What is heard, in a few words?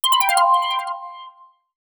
Beam Alert; Sony Ericsson; PlusFourSix; Rika (W705); Lars Edvall